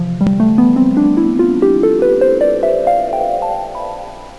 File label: NICE HARP; MUSICAL